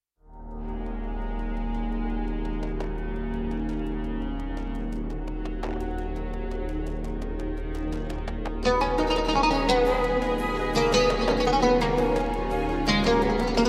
watani

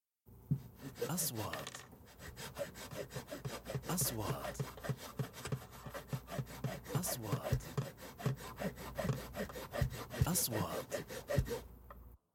Wood

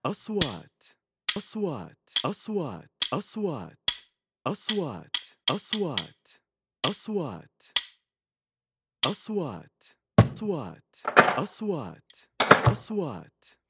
plumbing tools iron hammer Axe; wood

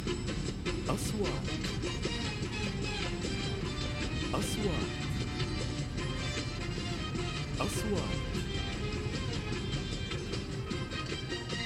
cars travel road with radio voice

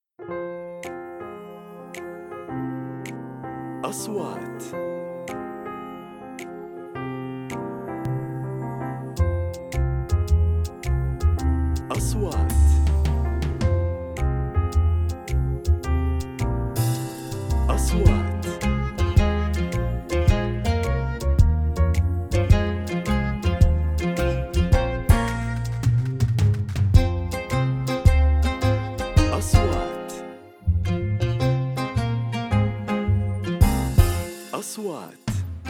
advertisements

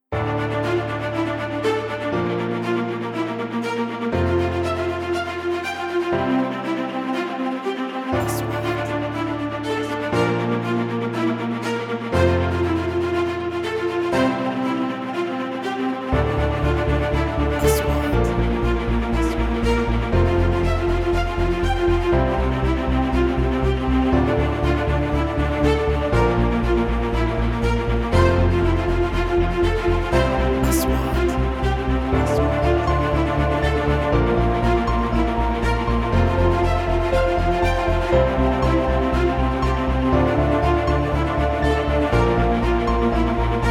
corporate

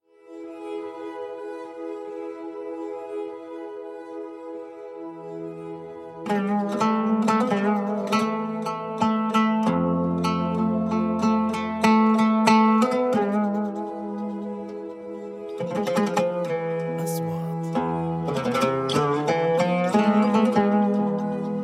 oud pad; oud taqasim

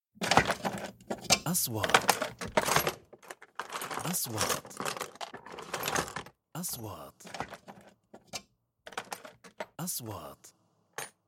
Wood

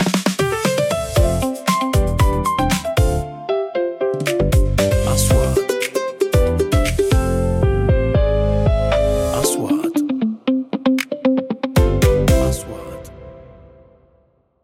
advertisements

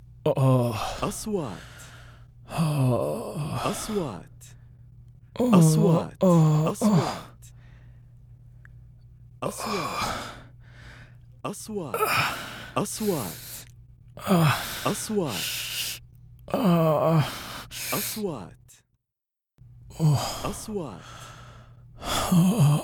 sick man feeling pain

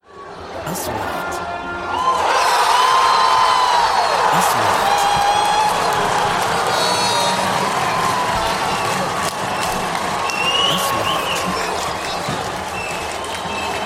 Applause for football lost a chance goal, stadium; sound effect

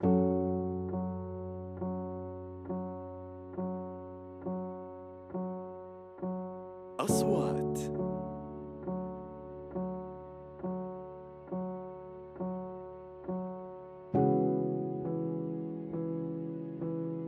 Jerah Music short

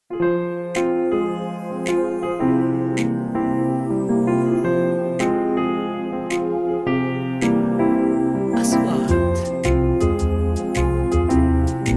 advertisements

